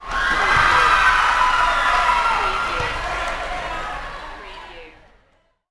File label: Basketball Crowd Cheering